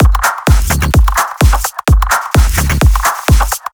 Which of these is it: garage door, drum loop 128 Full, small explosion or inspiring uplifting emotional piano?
drum loop 128 Full